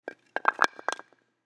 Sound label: Tire Wedge Wood Place Under Tire Chock; Transportation Aircraft